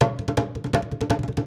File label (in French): Tamtam Monture Loop Rapide; Rakoo & Friends; Wii U